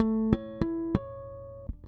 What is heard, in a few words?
Harmonic Riff, Bass; Construction Kit; Riffs, Patterns Licks; Bass X